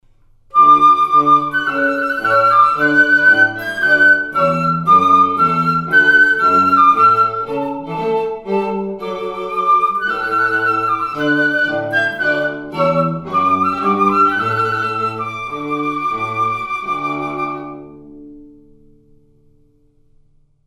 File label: Trumpet Voluntary In D